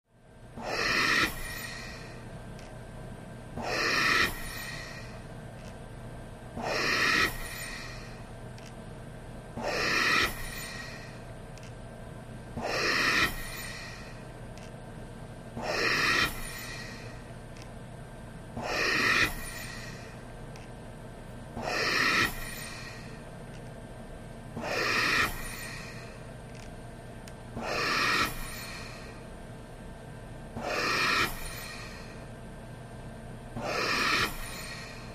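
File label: Respirator Breathing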